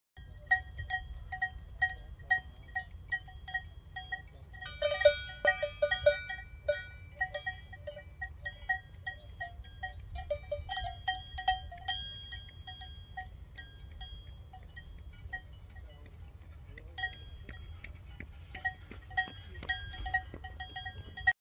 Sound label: herd of cows